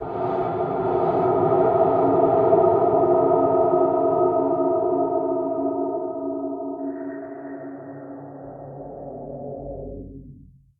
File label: Combat